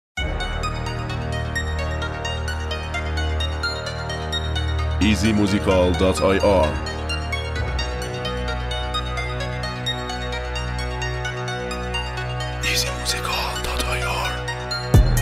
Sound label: Beat